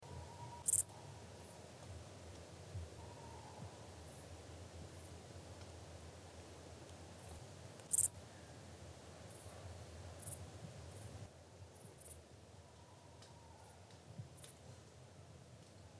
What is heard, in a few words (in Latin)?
Pholidoptera griseoaptera; BIOAC ORTH